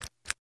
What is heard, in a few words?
Push Button Soft, buttons